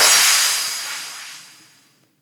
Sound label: Cymbals Crash; Vengeance Samples